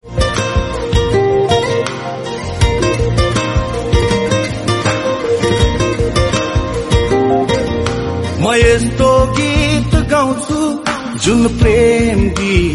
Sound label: nepali ringtone; prem geet